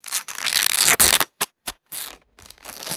plastic snack bag rustling and tearing open; foley

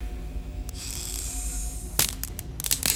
thin whiny electrical buzz and crackle; electricity

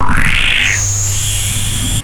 sci fi forcefield portal activation zap; electricity

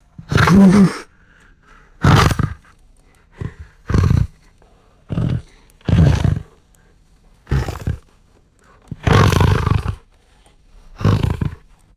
horse snorting and grunting exhales; animals

